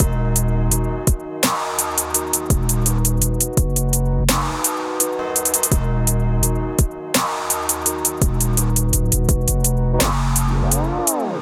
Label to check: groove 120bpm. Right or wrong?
Right